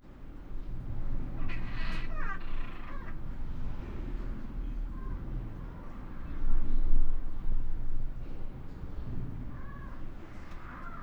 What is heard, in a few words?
daytime